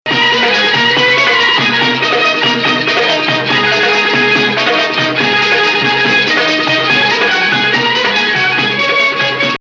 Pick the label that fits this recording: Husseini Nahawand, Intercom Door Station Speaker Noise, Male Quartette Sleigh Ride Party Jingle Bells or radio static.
Husseini Nahawand